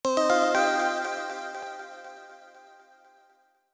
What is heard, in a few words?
Level Up; UltimateFishing2020